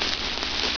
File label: Water, 水声; 特酷声音素材库